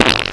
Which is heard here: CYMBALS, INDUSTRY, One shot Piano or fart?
fart